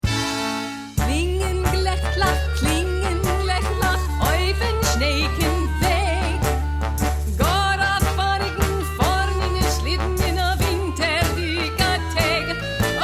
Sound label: jingle bells